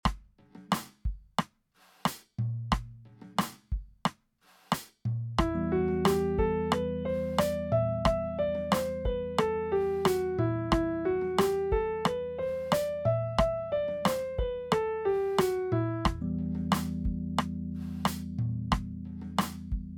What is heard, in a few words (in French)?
exercice de gamme